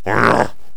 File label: mummy ack; megaglest